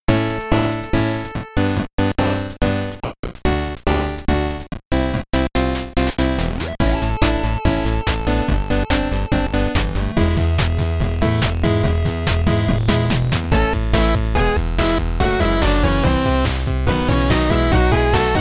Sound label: chip music